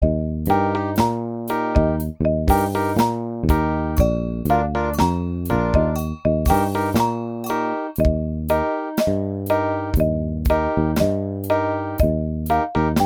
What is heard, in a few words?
Elevator Song E Flat